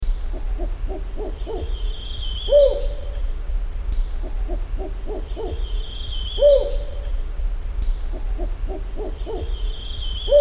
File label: Rufous Banded Owl; Wouter Halfwerk